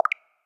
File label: UI Toggle On; They'll Come from the Moon